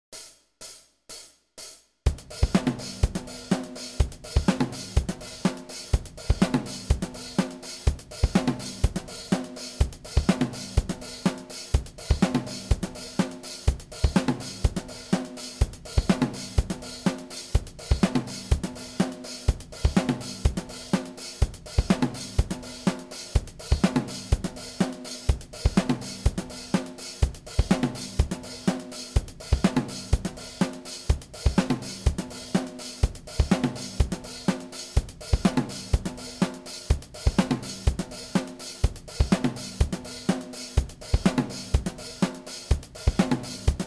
124bpm

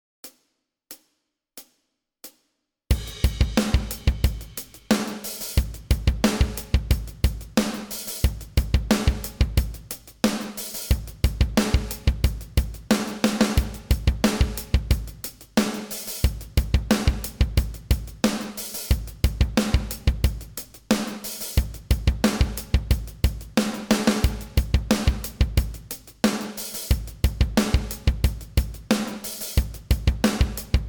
90bpm, bass

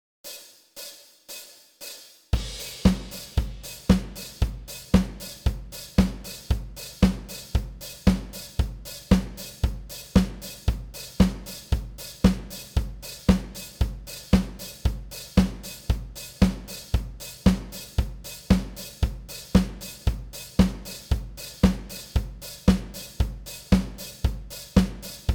115bpm, bass

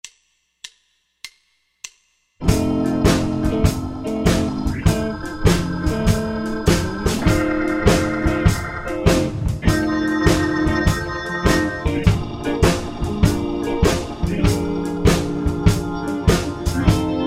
basic blues in F; Beginner Blues

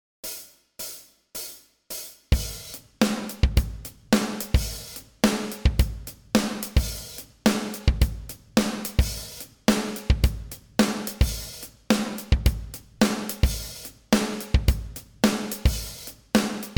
16th Note Anticipation 108bpm

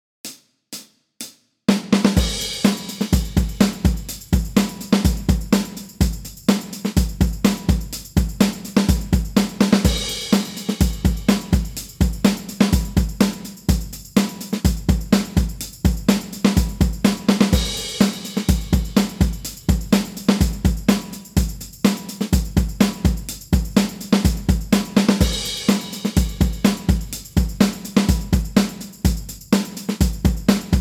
125bpm, bass

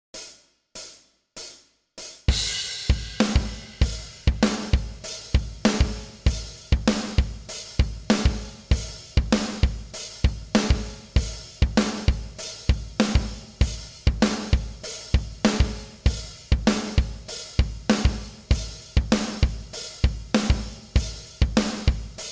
98bpm

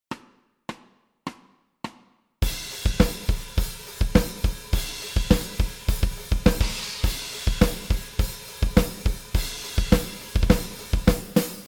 Solo, bass

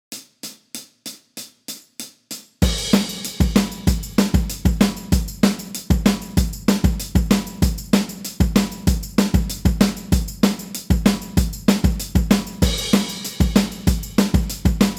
192bpm

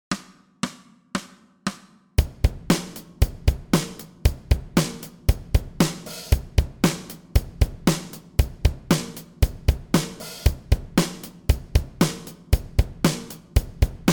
116bpm, bass

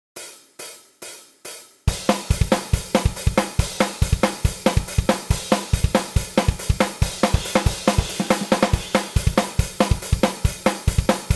Verse, bass

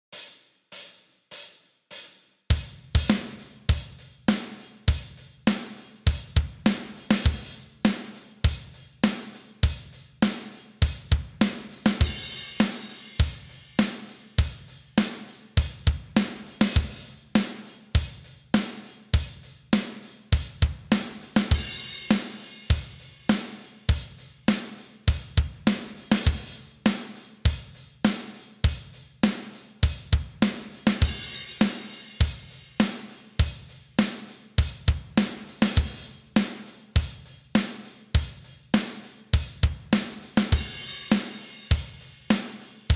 Drums 101bpm

